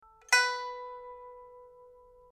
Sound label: pipa; chuYinKouXian